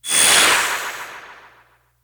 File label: umbrella